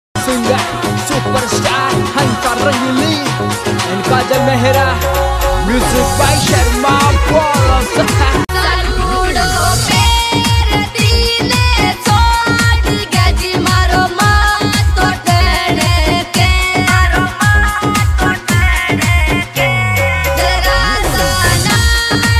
rajasthani marwadi ringtone

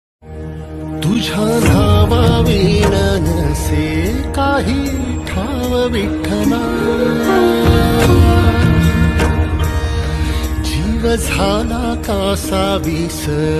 bgm ringtone marathi ringtone bgm marathi ringing; Vitthal

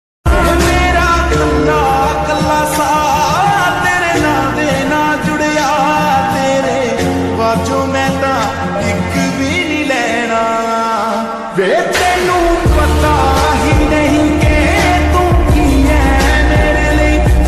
lofi version sad ringtone

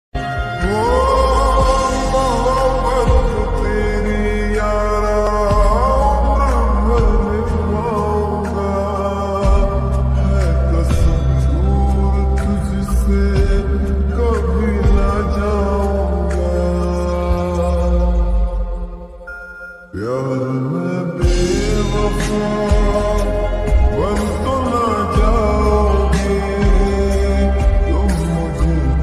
sad ringtone; telugu hindi sad ringtone; sad love ringtone hindi ringtone

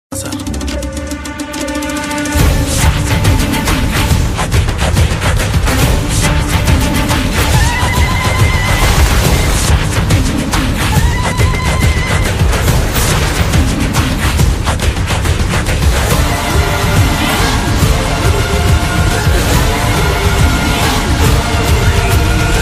trailer bgm ringtone tamil bgm ringtone; maharaja

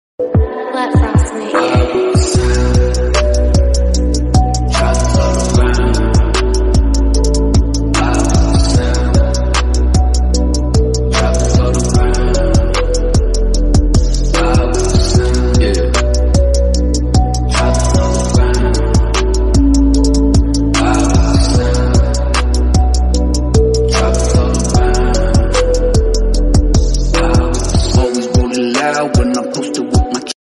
english ringtone bgm ringtone; merlin babaji vibes

